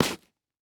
Foley Footstep Hard Sole Dress Shoe Skid On Cement; Foley Footsteps